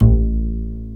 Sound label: BS UPRIT B, Jazz Bass Ac. Fretless; Roland LCDP02 Guitar and Bass